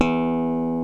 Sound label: ROCK KIT